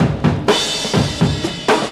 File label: cold break ill with the drummer, drum loops